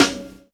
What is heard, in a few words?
SD, Dance Mega Drums; Best Service; SD-HIP